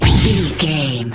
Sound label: sci-fi weapon blaster laser boom zap